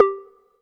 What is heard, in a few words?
Percussive FX; Vengeance Samples; VEH1 Percussion